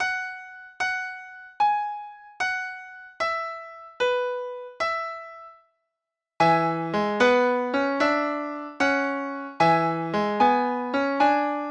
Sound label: blues mary on E